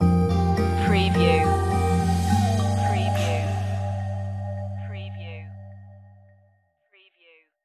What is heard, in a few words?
safari lite stinger